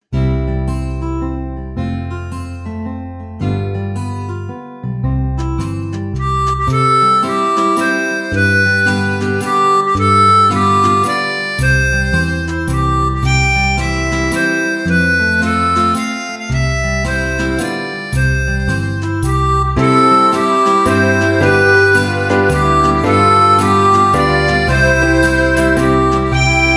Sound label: Happy Birthday to you in C